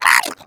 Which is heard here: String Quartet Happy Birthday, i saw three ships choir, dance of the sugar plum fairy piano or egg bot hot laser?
egg bot hot laser